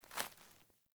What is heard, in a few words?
Boot Walk Veg, Footsteps; Characters; Enemy; Melee Grunt; Boots; Sinecure